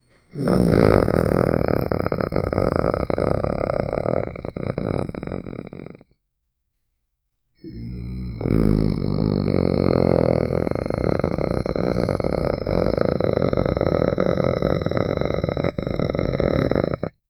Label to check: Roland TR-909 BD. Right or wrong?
Wrong